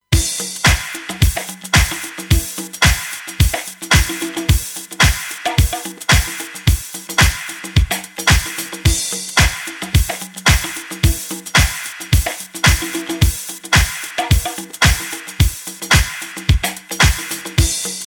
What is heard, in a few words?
Mastering Limiter Beat; Bettermaker V2